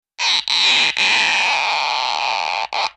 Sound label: cartoon squeek